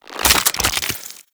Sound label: Infested Uzi Reload; Warframe